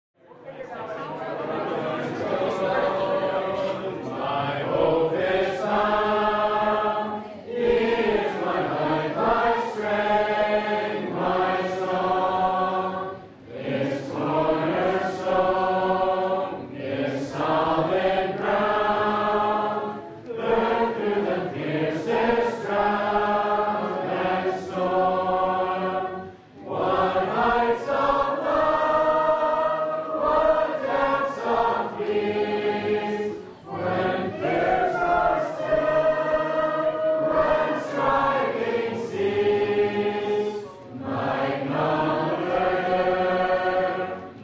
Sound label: hymn, Hymn Sing; 2015Conference